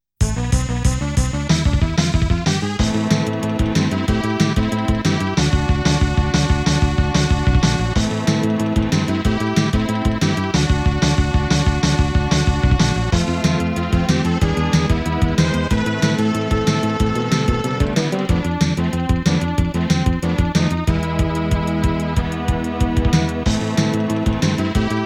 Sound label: Battle theme; Estpolis Denki 2; Lufia 2 Rise of the Sinistrals